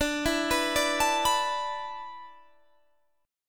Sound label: D 6th Suspended 2nd D, chord